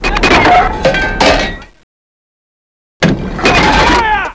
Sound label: shell reload